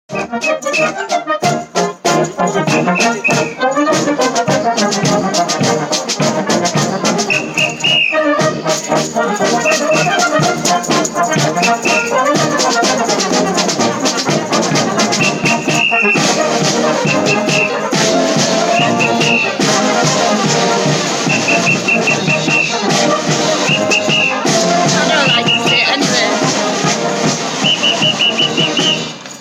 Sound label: Hornpipe